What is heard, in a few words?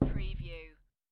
Dice Throw One Die On Felt